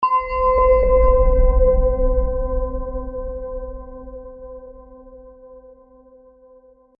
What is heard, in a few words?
Heart Tone E